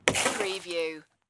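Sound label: Snowball Brick House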